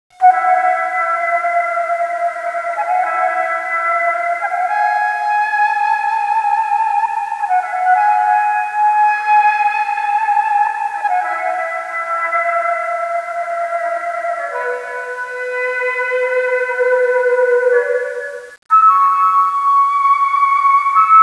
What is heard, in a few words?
daegeum ambience